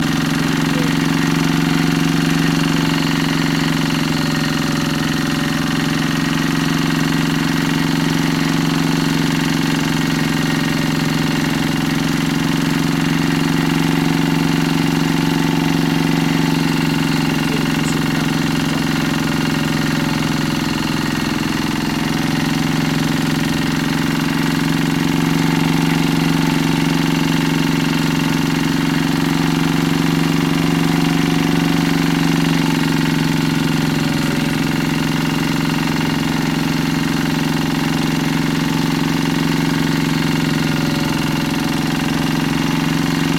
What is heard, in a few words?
boat engine running loop